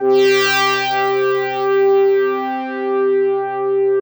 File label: Brass Pad